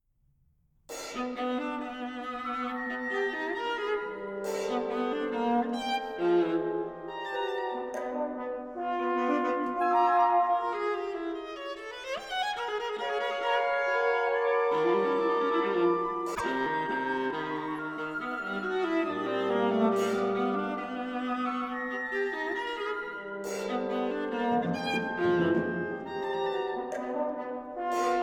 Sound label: serenade for viola and chamber orchestra, rondo